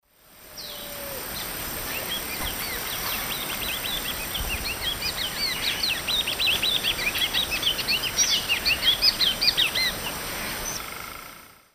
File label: Oryzoborus crassirostris, song; S. America; Eastern Lowlands